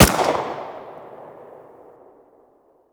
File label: mp5 attack; Rust